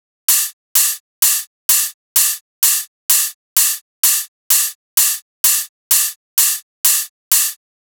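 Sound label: open hi-hat, Drum Loop 128BPM; VEC5 Drumloops; VEC5 Multi Loops 128BPM; Loop Elements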